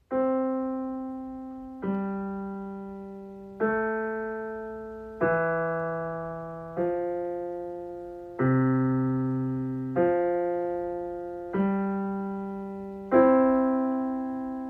Pachelbel Cannon, Home Recorded, student recordings